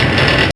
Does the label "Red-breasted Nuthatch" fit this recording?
No